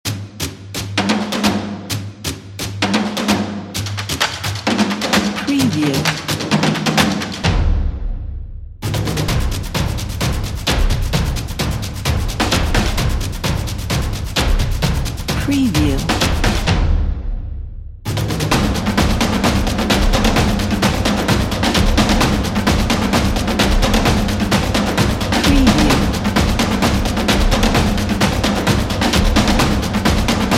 epic percussion music kit

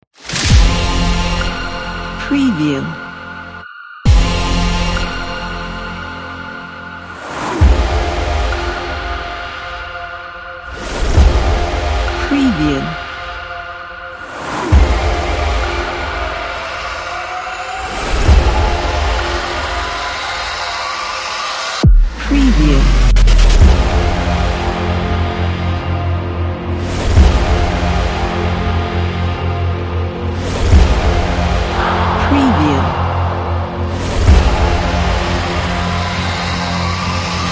blockbuster trailer horns

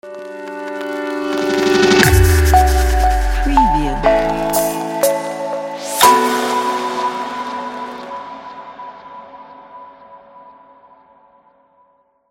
minimalistic logo

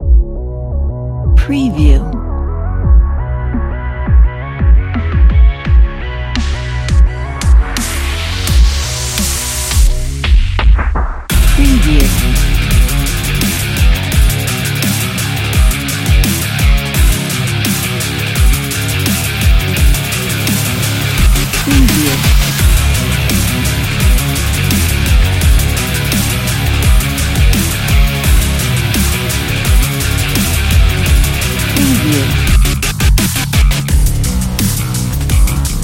dubstep rock power

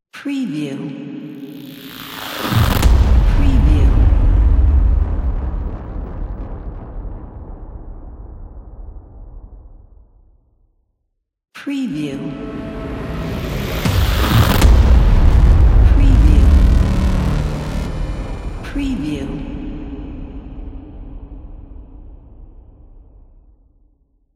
big explosion logo

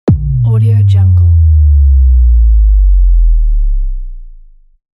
sub bass impact

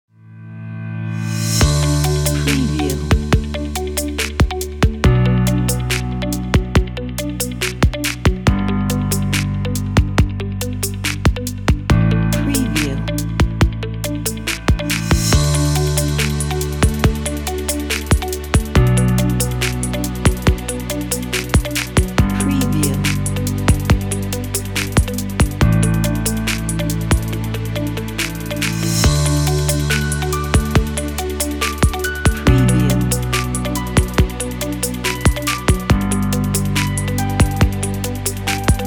light elegant inpiring

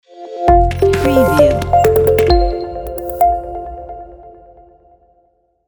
ambient glitch logo

